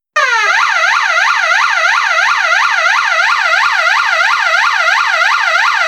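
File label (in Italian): YELP, Sirena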